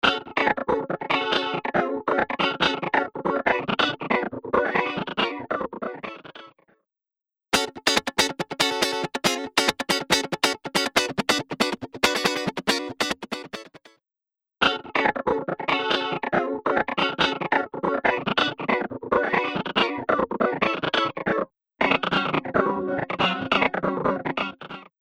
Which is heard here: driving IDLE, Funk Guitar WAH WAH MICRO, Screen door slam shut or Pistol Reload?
Funk Guitar WAH WAH MICRO